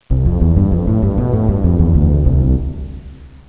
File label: bass tuba